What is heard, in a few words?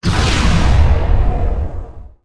concussive shot cast; weapons; dota; skywrath